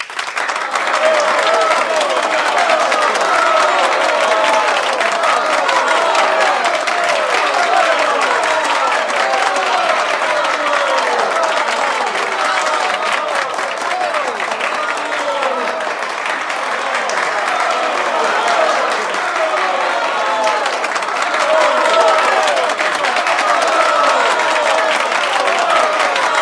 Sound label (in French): applaudissements